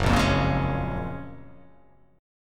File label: F sharp Major 9th